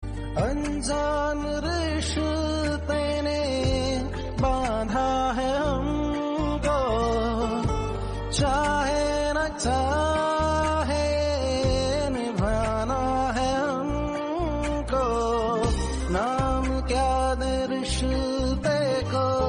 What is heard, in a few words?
Romantic Hindi TV Serial